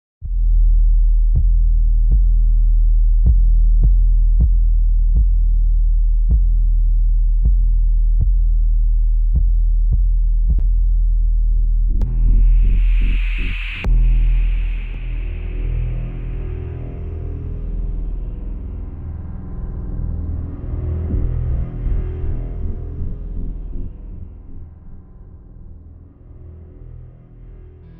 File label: Punk Bass; OF FATHERS AND SONS – Soundtrack